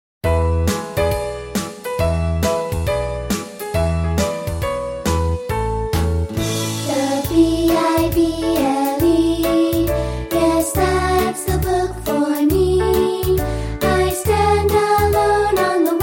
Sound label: Childrens Choir